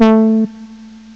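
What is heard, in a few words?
brass synth, ANALOG; CMI Sound Library Rev 1.3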